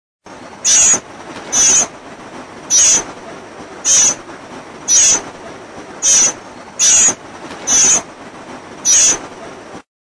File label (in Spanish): voz calandria grande volantón